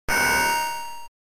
transformation

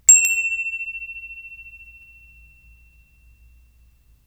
Bell Door

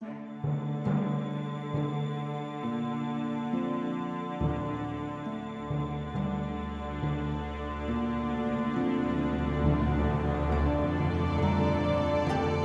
Linear Tense; Game of Thrones: A Telltale Games Series

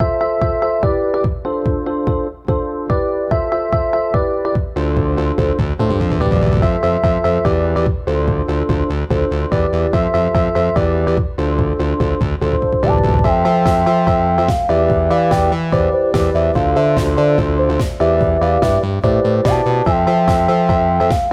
Working; Sakura Gamer; macOS; Windows

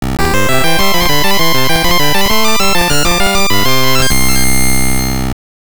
Area Clear; GB

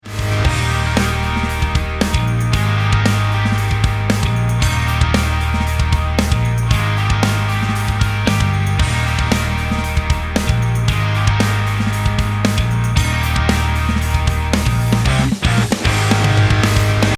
start round

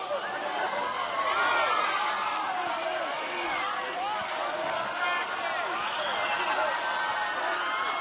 BGM Attacked BGM

